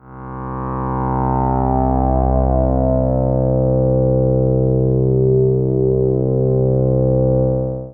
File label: VIBES; AKAI Anime Sound FX; ZPINZOUNDS